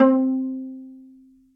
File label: Viola Solo Pizzicato; Ableton; Orchestral Strings